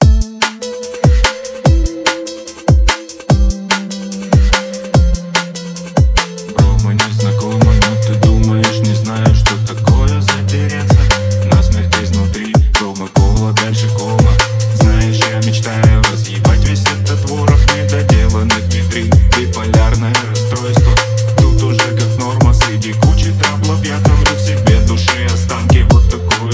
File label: drum and bass beats remix